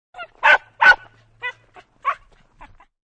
Yppy Dog 2x Whn, Yappy dog barks with whine; Yappy Dog Barks 2 Times with Whine; Dogs; Animals

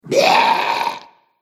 Zombie Bad Manners, Zombie Sound Effects; horror

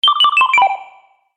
Science fiction Activate Sound

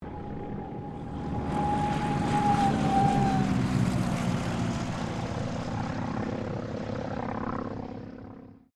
AIRCRAFT CA-18 MUSTANG LAND; P51 /CAC-18 Mustang; Airplanes; Vehicles

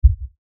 LFE Hit, Lfe Hit Low Bass Impact; Blastwave; Sound Effects:Sound Design

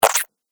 Cartoon Slime Splat; Slimy Sound Effects

